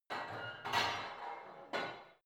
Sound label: barbell heavy plate put on creaky reverby; sport freizeit; fitnessstudio